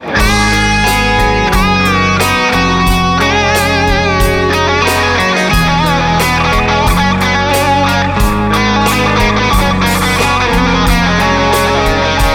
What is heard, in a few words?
Guitar Solo